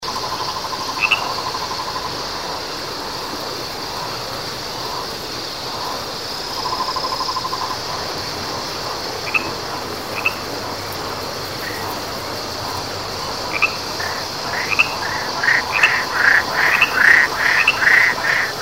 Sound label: ambient swamp